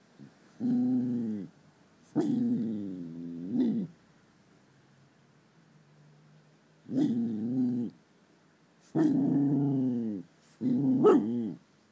puppy house guest guards the flat from stairwell noises